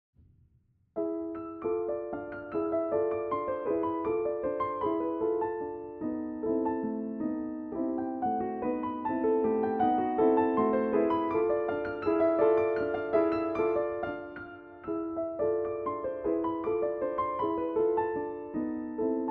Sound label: Bluthner high